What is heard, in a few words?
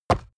horse step stone